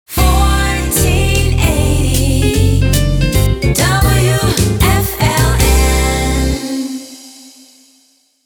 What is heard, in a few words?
Jingle Sting; WFLN 1480